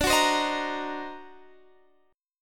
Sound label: D 7th Flat 9th Flat 13th D, chord